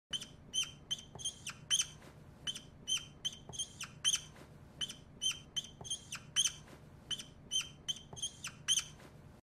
Marker on Whiteboard

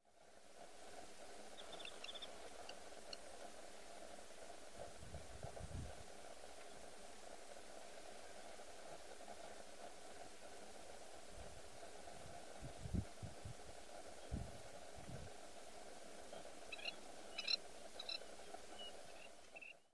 Collared pratincole

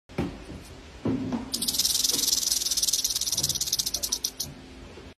rattlesnake; مار زنگی

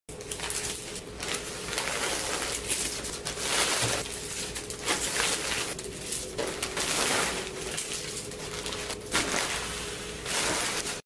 packing

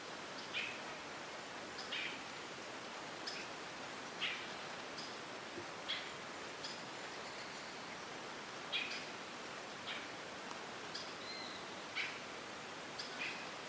Scarlet throated tanager

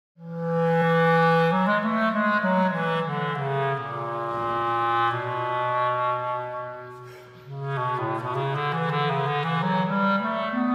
Basset horn; کرباست

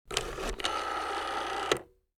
rotary phone

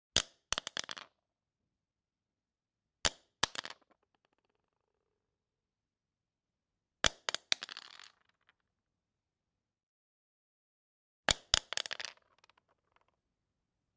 Dropping pencil; افتادن; افتادن مداد

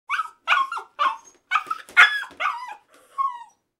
akita, سگ اکیتا ژاپنی

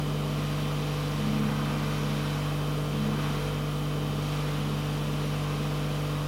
Large Household Fridge

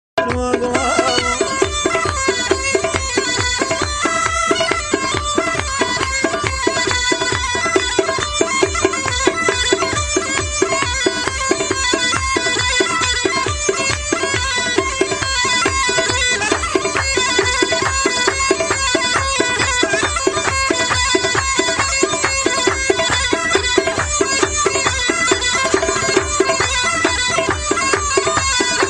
Dozaleh

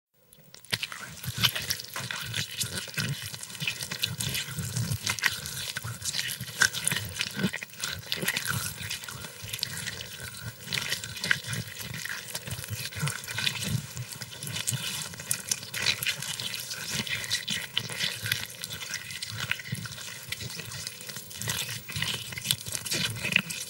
Silkworm

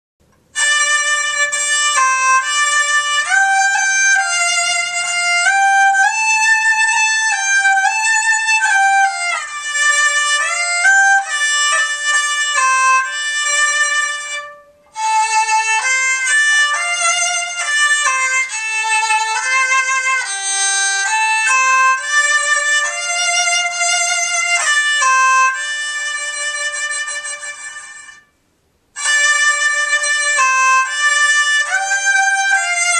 kokyū

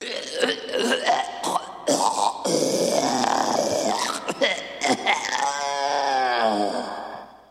Strangulation

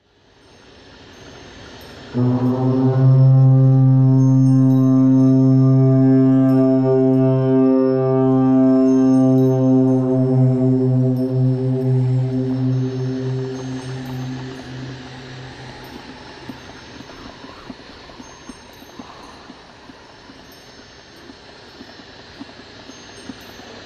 Shakh Nafir, ساز شاخ نفیر

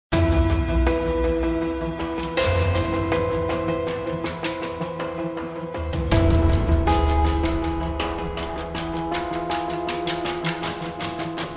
mysterious music; موسیقی معمایی بی کلام

